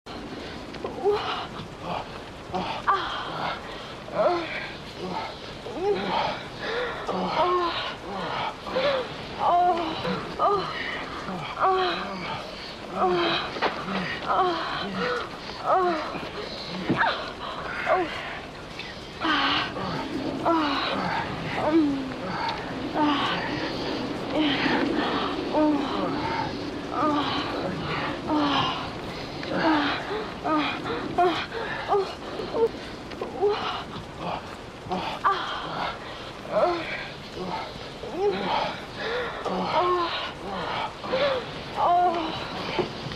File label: Relaxing cozy weather with couple making love.